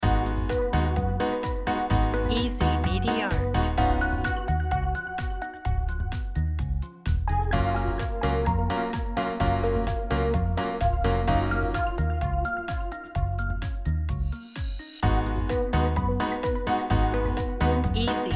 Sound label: love and jazz loop